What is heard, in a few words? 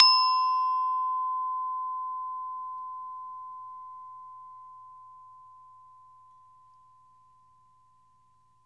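SARAN C#4, Metalophones, Pitched Percussion; E-MU Producer Series Vol. 7 – Old World Instruments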